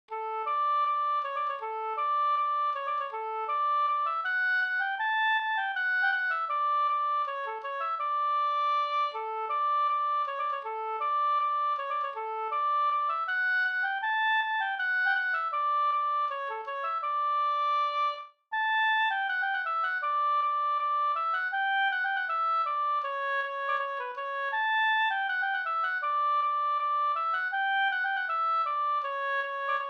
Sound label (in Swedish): skanklat; Mora aldre brudlat Da